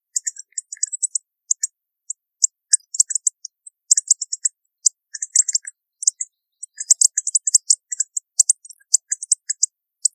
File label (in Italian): frosone